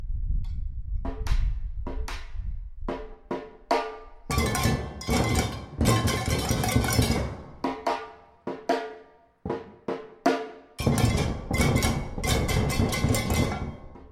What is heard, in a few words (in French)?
percussions break